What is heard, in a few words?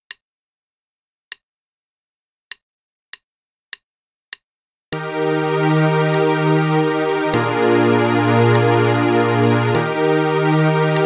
Play along Etude Expressivo (with strings, qn=100); Rubank method